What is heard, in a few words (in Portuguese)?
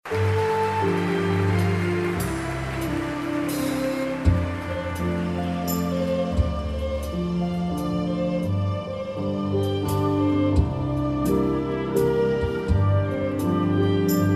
Piano e Cordas